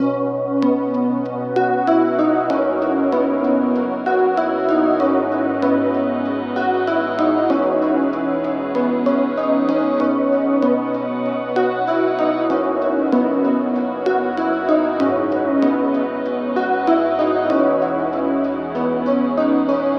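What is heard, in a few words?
96 synth keys B major; diamond jambo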